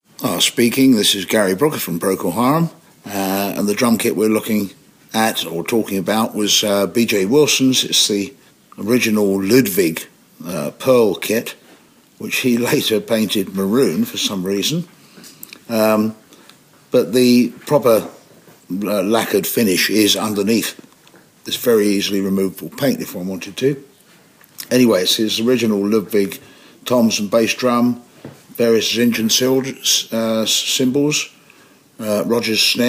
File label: gb bj drums